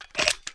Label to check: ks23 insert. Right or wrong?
Right